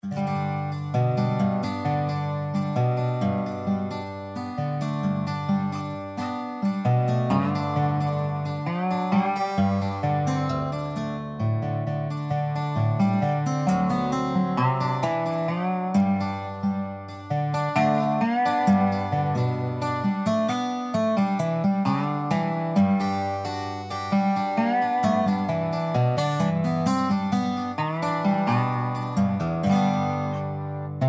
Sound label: DOBRO HORSE TRAINING